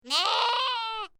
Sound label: Sheep Lamb, Single young sheep baby, lamb bleat.; Sheep Sound Effects; Animals